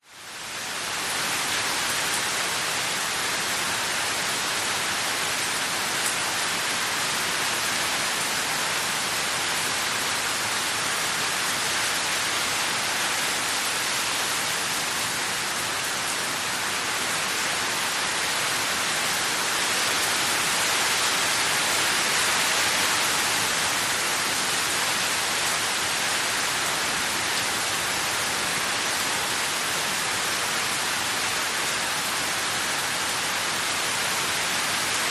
nature rain med heavy on trees and hard ground constant with heavy fluctuations